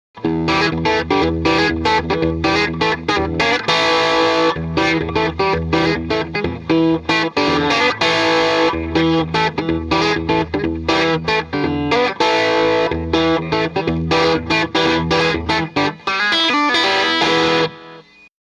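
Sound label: m75 tele bridge pickup crunch jtm45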